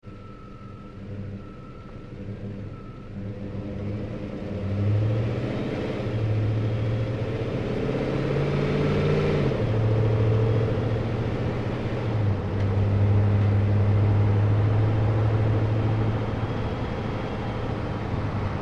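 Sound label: Truck GMC Savana Onboard Drive Corners Medium Fast; 2010 GMC Savana; Trucks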